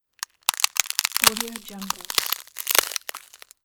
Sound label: ice fracture